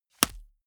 small punch hard

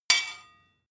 sword blade rattle short

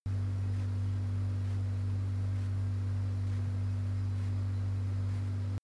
kitchen clock

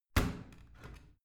washing machine open

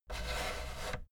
fader plastic scrape double up slow

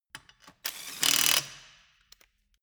impact wrench screw fast single

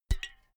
filled can hit dripping

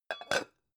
pan close chink soft

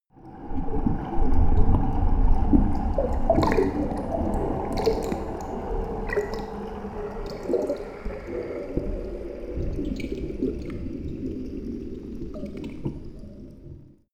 water fx

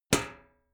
club hit sheet ring medium

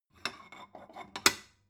stone bowl shelve

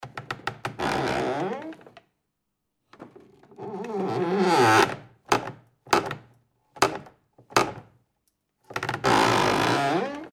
door squeal

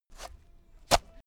digging

